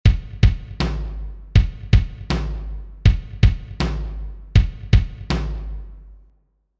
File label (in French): Gavot percu phrase a 80